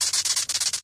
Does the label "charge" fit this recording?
Yes